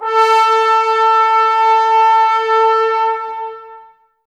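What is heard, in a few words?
Brass trombones A; Miroslav Vitous - Woodwind Brass Ensemble; Tbs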